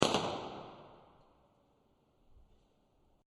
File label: Huge Firecracker Bang; Fireworks; Crafted Signals